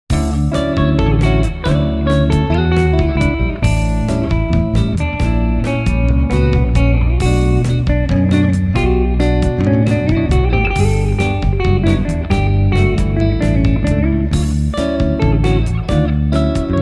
bossa nova

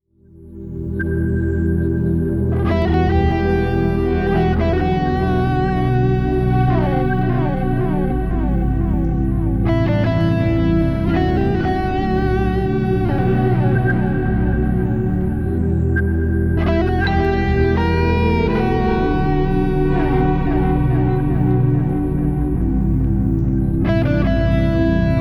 ambience

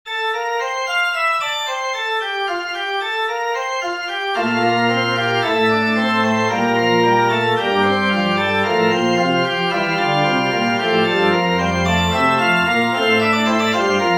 toccata in f major

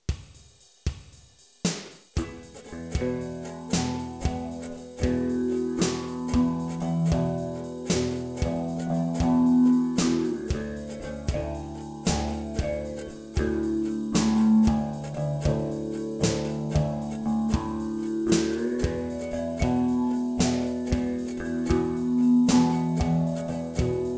quiet headed daydream instrumental

